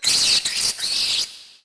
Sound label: rat; Mafie 1